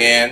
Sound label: Vocal Oneshots; Vengeance Samples; Vocals